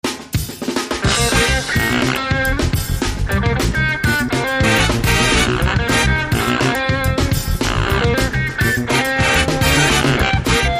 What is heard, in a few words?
Freewheeling Funk